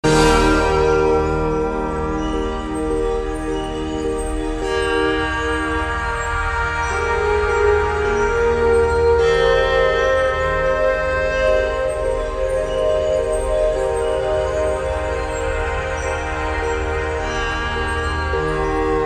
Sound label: intro ambient end; Summer Catchers